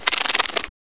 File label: snapbug dig up